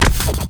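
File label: attack punch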